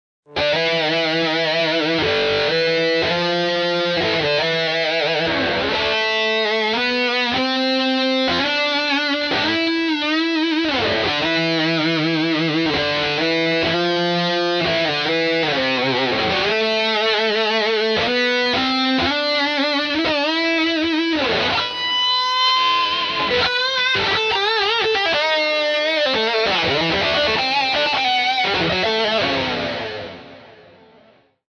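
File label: Guitar Solo Mix; Surround Session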